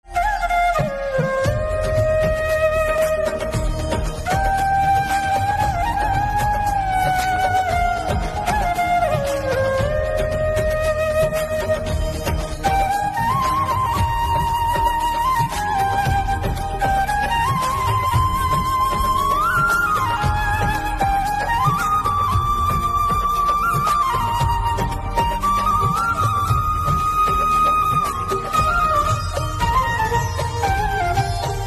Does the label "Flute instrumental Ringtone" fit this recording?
Yes